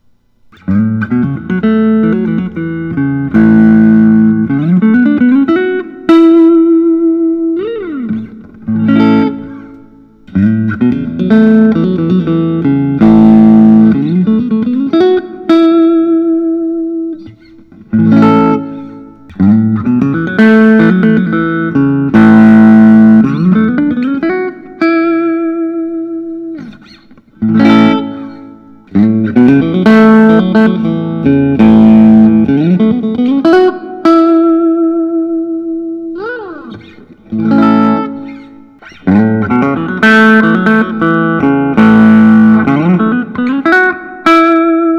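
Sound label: paul reed smith special 22 semi hollow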